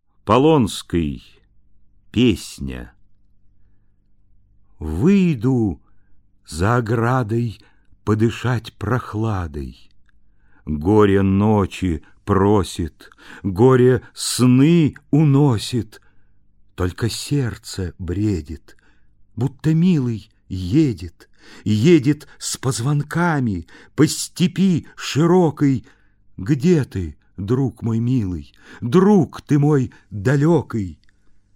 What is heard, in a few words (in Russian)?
Песня; Rodnaya rech 3 klass; audioyenciklopediya dlya detej